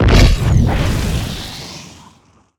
Leap Impact Launch FLAME; Evolve; Meteor Goliath

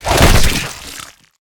Spider Trap Grab; Lurker; Evolve; Gorgon

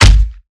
Melee Impact Base; Evolve; Behemoth

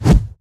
Whoosh Arm; Evolve; Meteor Goliath

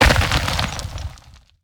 Leap Attack Impact Debris; Evolve; Goliath

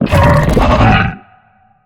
Ability Mortar Tell; Evolve; Behemoth

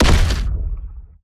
Stealth Attack Land; Evolve; Behemoth

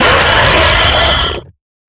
Harpooned; Lurker; Evolve; Gorgon

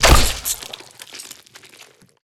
Tongue Grab Hit; Evolve; Behemoth

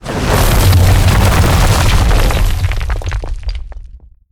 Rock Throw Grab; Evolve; Goliath

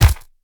Collisions FS Slow; Lurker; Evolve; Gorgon

